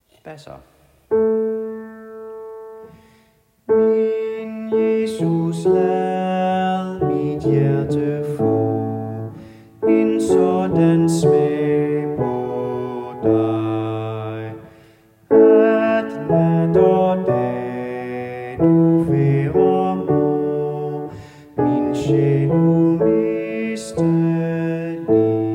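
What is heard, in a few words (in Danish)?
Min Jesus lad Bass; laudate kirkens folkekor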